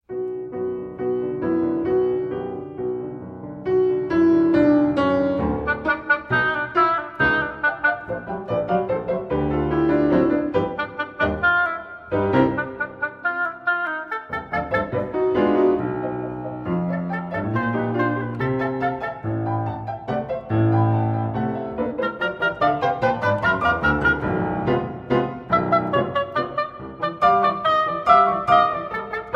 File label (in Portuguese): Sonata Para Oboé e Piano